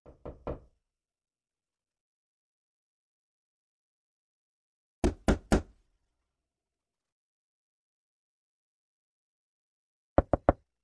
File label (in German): verschiedene tueren klopfen; Wavemusik